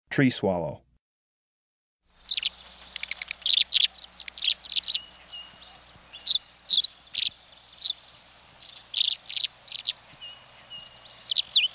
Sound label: Tree Swallow, Birds of the Chicago Region